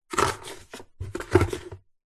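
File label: washing detergent powder scoop from plastic tub with plastic scoop; household; zapsplat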